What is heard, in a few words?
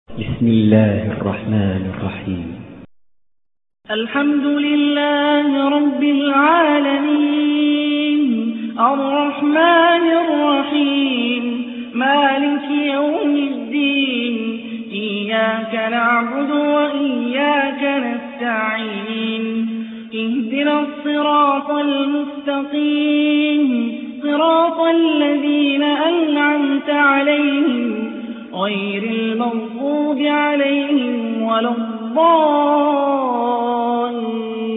quraan faatihah